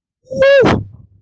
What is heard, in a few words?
gnome wooo